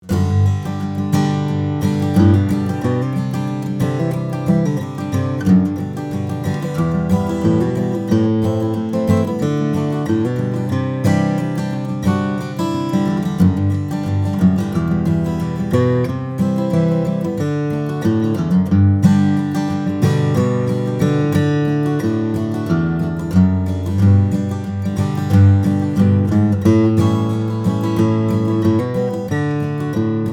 posch j-m, steel-string flattop guitar; New Posch J-M Mahogany/Adirondack (Red); Leo Posch

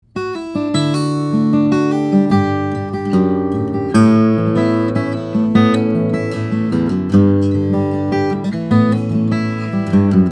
Martin 00 18, steel string guitars flattop; 1937; mahogany adirondack spruce

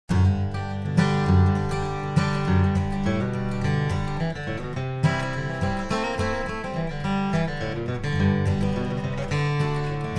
Martin D-18, steel-string guitars, flattop; acoustic guitar

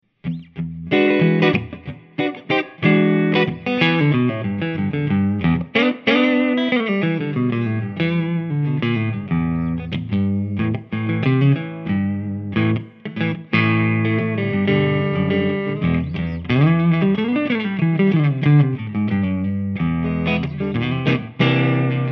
spalt, electric guitars; the nouveau series mucha summer; spalt instruments

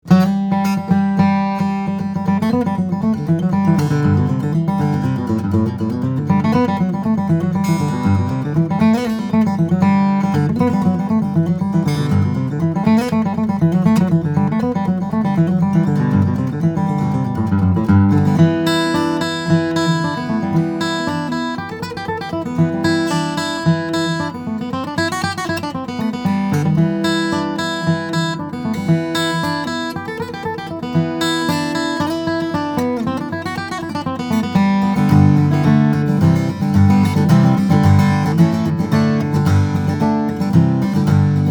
indian RW, steel-string guitars; boucher; bg-52; indian rosewood; adirondack spruce

